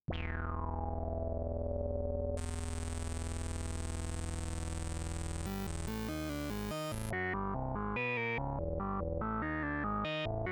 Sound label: synth square